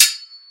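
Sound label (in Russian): меч с отзвуком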